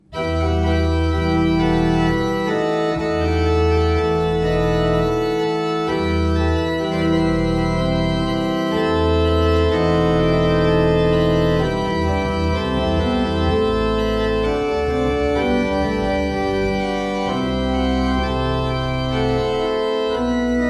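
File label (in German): Bosch Raupach 5 I P, pfeifenorgeln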